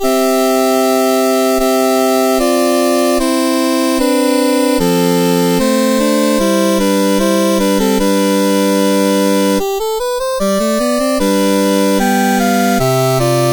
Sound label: Toccata in the Ionian Mode, CSG8580R5; Wesley Noles